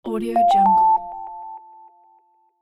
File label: message prompt